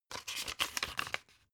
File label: old flip page